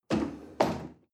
chair wood place slide medium

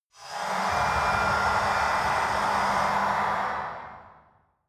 mechanic noise steam

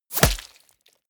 bullet small impact body

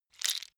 bone small handling left

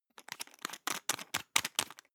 toothpaste screw cup open fast hard

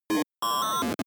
broken computer random fraction

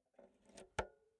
insert jack multi socket slow

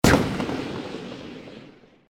shot

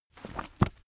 heavy book shut

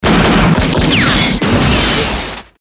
shot seq

